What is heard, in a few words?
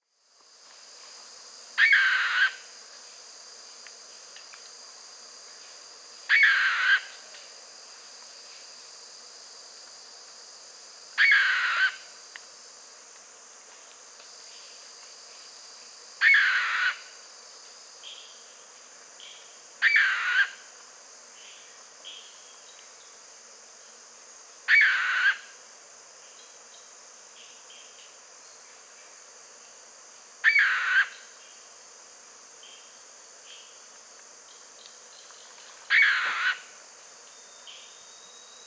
Frogmouth